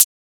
Closed Hat

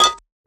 Perc, Percussion; FLPRO

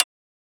Transient; FLPRO

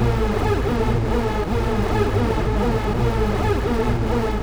Techno

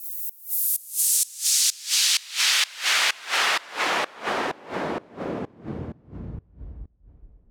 Fall Side Chained

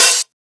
Open Hat; FLPRO

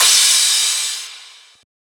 Crash

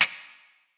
Snap

